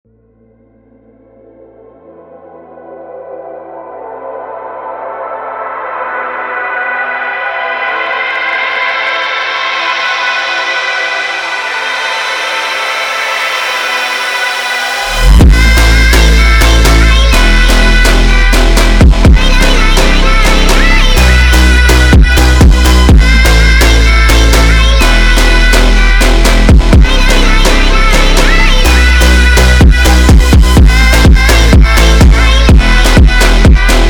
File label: Funk